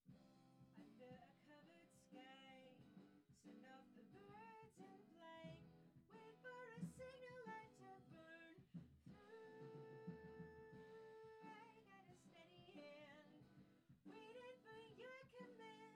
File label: GTR